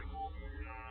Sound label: "ah", Voice ooh ahh